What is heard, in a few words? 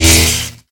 slash elect